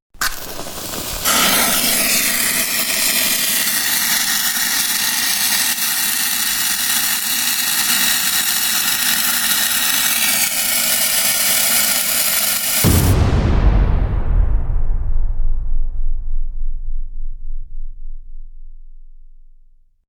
Striking a match and lighting fuse wire which fizzes while it burns; Striking a match and lighting fuse wire which fizzes while it burns ending with a huge explosion.; Flame; Sound Design